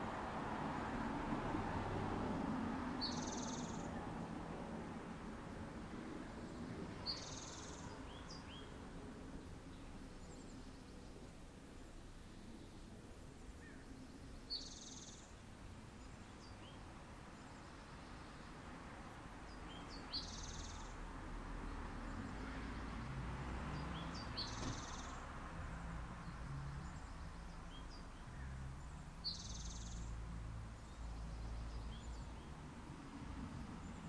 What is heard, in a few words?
spring in palo alto